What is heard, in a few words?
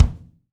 BD, DR BD; Best Service Gigapack 2